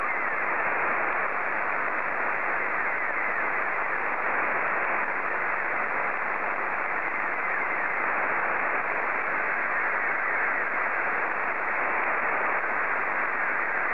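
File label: SSTV, Scottie 2; JA3OEN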